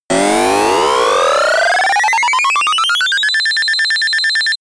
points upgrade; whooshes rises; risers

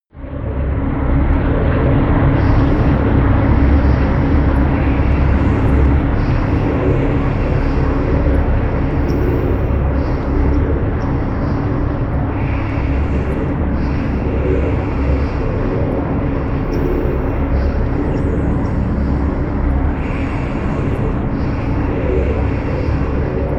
drone noise

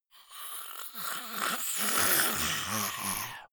monster choking zombie idle high; koerpergeraeusche; comedy-cartoon; soundpool

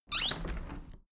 window handle plastic squeak open soft; objekte; fenster

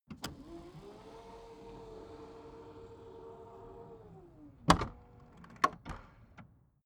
car trunk close automatic

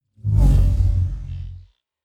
sci-fi vehicle train drive by hum fast; raumschiff

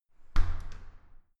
entrance door close soft

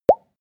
pop bubbles deep; koerpergeraeusche; menschen; soundpool

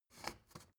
step ladder wood scrape single; schritte

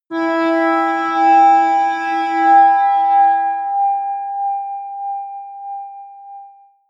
atmospheric feedback synthesizer high, drones

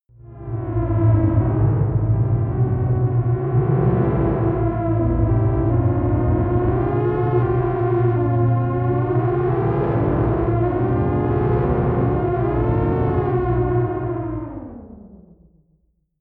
humming drone, drones

